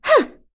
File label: Vox Jump; Yuria; kingsraid